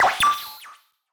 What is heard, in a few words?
Notification, notifications; Motorola Droid MAXX XT1080